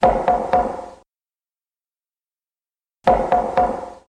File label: HTC SMS Tone